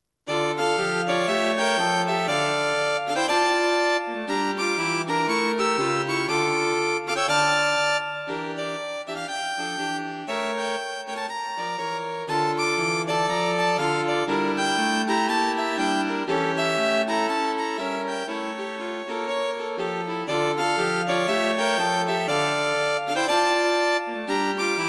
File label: String Quartet; String Quartet for Concert performance; A short, work with jazz overtones and modern harmonies.; Fast but not too difficult.